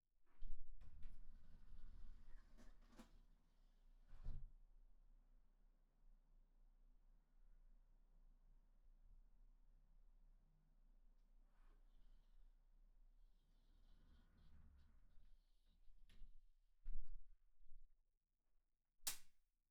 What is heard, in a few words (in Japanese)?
窓開閉; KU100; 色々な動作